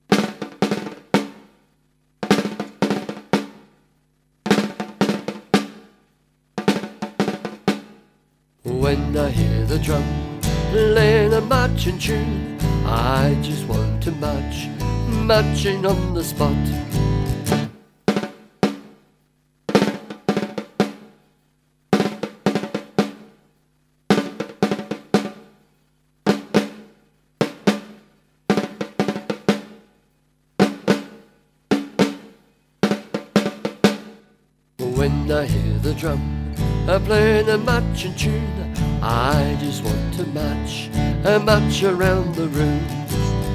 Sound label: The Drum Song; music for early education music and movement programs